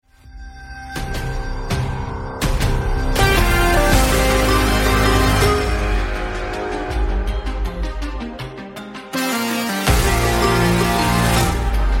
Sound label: music brawl draft 95bpm; Risk of Rain music pack; in-game music